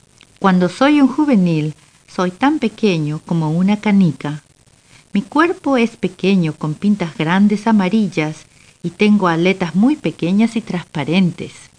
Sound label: Sound trunk